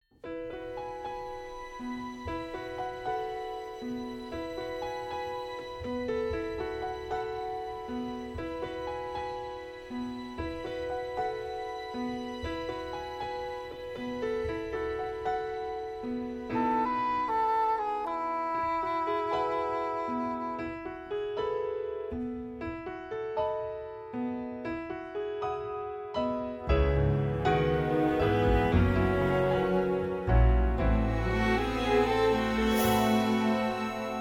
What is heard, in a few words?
INSTRUMENTAL